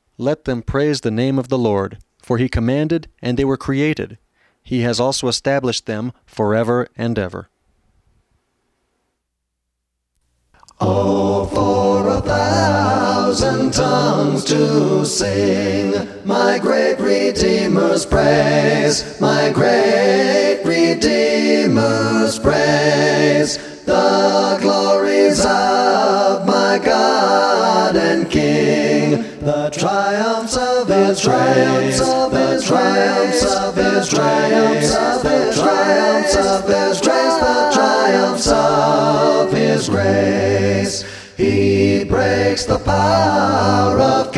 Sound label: music english